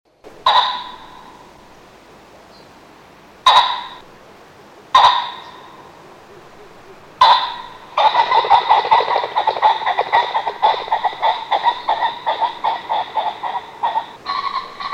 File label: Pheasant sounds